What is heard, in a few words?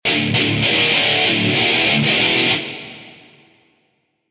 guitar